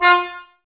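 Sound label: brass trombones mute; Miroslav Vitous; Woodwind Brass Ensemble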